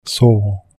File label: saw